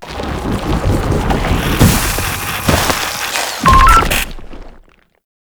Purification Machine Buried End, Infested Survival